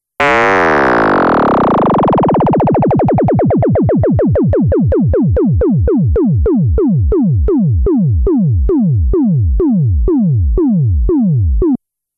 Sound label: winding down